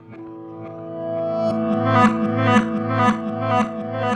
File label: Bass, Promotional Loops; Sonic Foundry (Sony Creative Software); Not Just Another Pretty Bass